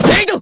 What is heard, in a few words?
dagger; kurogane